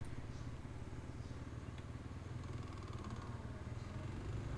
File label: klx250s carburetors jetting sound